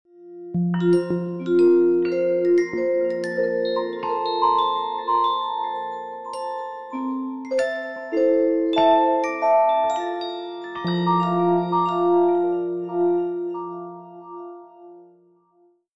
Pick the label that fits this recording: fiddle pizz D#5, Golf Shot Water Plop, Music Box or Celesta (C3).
Music Box